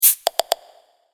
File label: Operator New Message